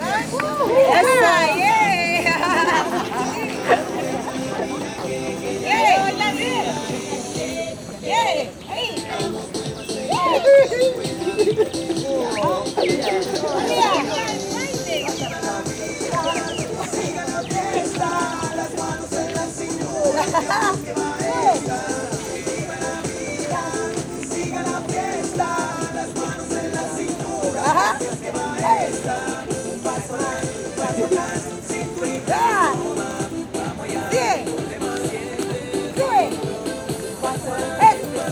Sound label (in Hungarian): zenes tanc; szerelempark